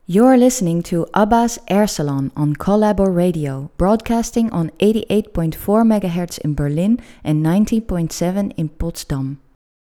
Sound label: Air Salon Jingle; ABA